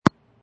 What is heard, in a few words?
Tennis Ball Bouncing on Court Close; Sports